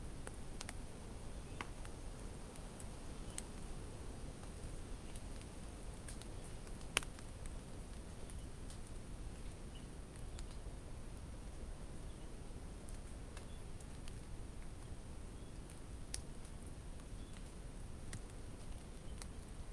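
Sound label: bolivia samaipata forest ambience exterior; ambix; fvtt sonniss gdc audio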